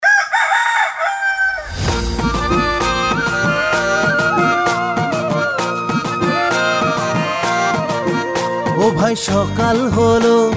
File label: bengali jingles